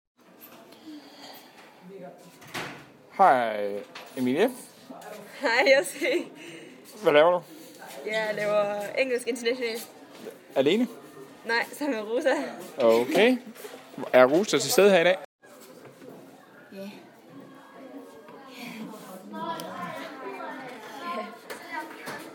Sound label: hmmm